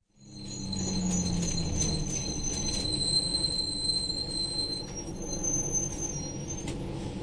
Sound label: squeaky bus stop; Sound FX Real Life